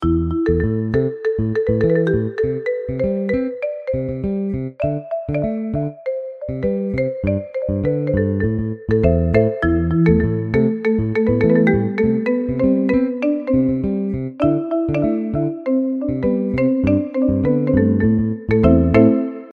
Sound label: marimba hindi ringtone